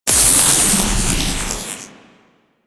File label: Corruption Pickup Hostile Large; Warframe; Missions; Zariman